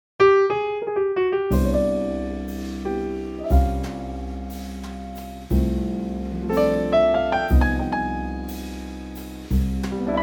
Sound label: C trio